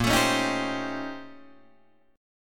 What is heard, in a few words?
Bb Minor Major 13th Bb, Guitar, DADGAD; BbmM13; strummed; JGuitar